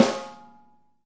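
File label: snare A3, multisampled real kit; REAL LIVE KITS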